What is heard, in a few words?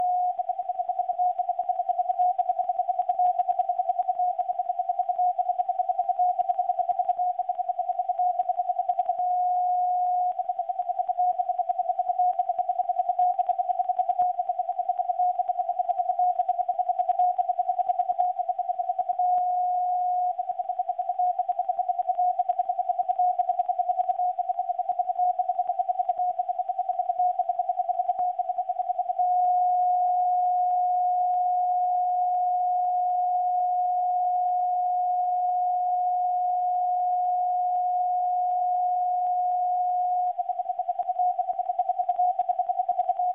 Beta 25 kHz